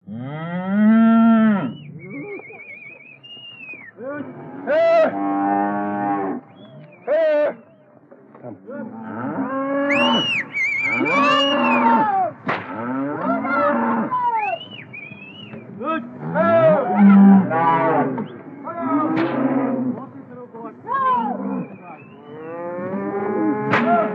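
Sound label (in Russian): стадо коров с пастухом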